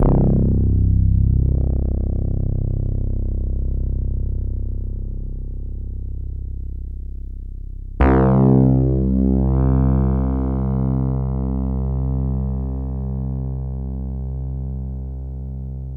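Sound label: CZ-1 electric bass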